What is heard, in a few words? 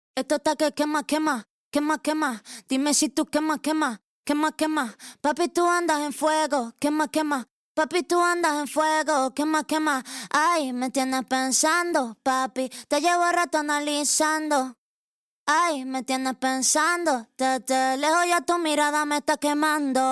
vocal